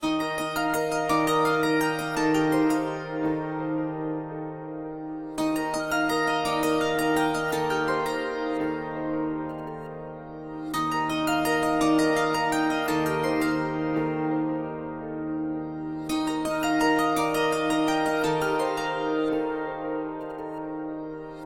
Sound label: Ripples, Ringtones; Cisco Collaboration